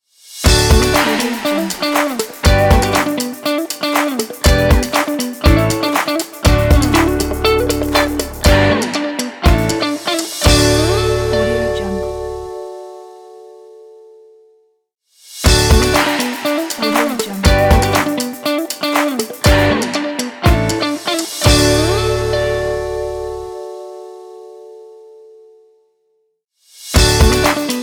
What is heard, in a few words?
upbeat happy logo